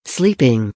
sleeping

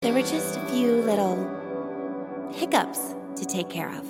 hiccups

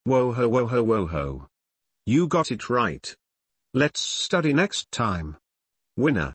woho woho woho

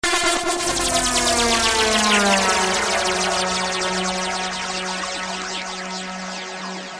laser horn

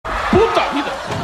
ringtone

